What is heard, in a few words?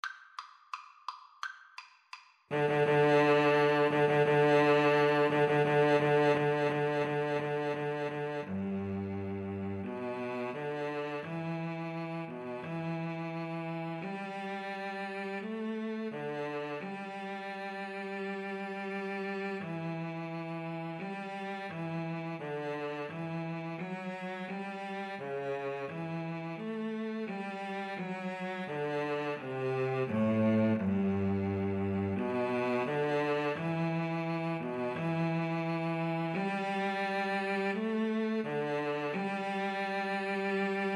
Cello Duet